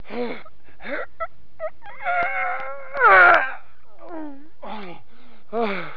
struggling

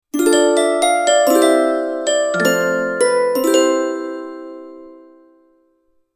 christmas logo transition

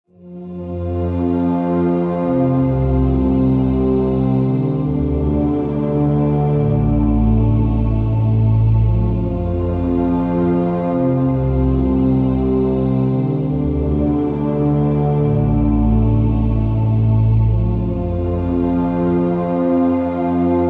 eerie background music intro

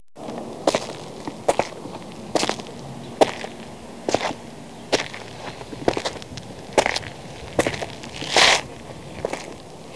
walking outside

